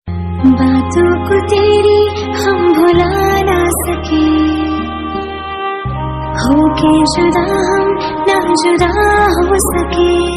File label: Hindi Female Ringtone